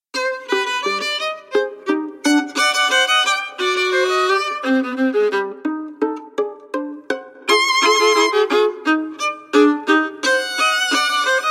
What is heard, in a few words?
contemporary feeling